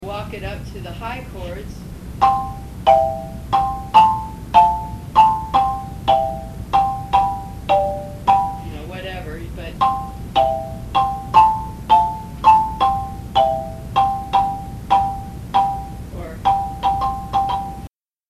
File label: lead chordal high, marimba